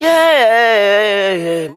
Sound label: "yeah" sung